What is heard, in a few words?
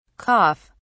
cough; whats the matter